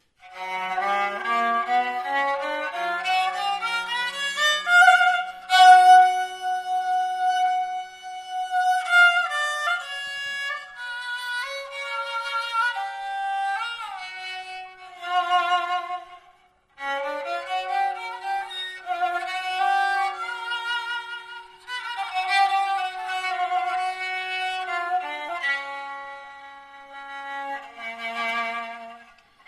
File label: persian kamancheh audio sample